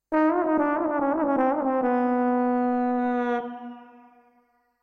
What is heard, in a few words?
Humor Trombon; Creative Sound Lab